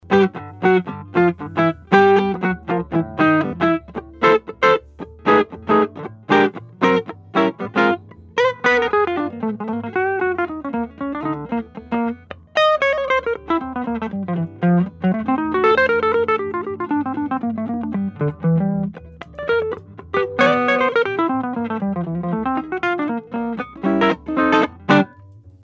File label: RFT MV 691 w M 70 Gypsy guitar